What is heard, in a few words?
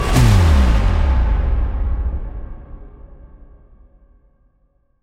Bass Drop; SoundsCrate